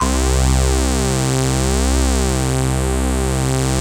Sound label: C2, synth line; 90 s Sample CDs; NiceBeats; SynthLine VOL-3